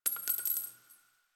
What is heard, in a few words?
Target Practice Shell Casing; Quincy; Warframe; Quests; Nineteen Ninety Nine